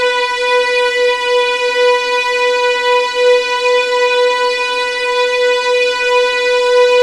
B4 LEG FVL R, The Ultimate String Collection; East West

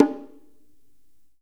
BONGO LO TON; Big Fish Audio; Ross Garfield Drums VOL-2; GM PERCUSSN

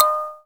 FLAM SANS D#4, KALIMBAS; Spectrasonics; Heart of Africa

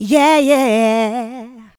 Vocals, Adlibs; Houseworx

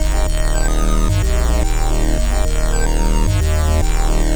Bass Line; Eclectic Electronica

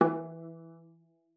E3, VIOLA PIZZ; Xsample VOL-5; 90 s Sample CDs

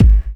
BD; Best Service; Dance Mega Drums

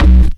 Fire Bass; Drum & Bass; AMG - Prototype Drum & Bass

